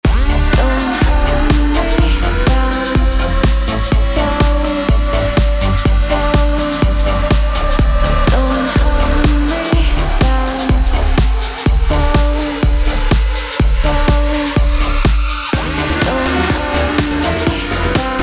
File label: Harmonic Mixing Example BAD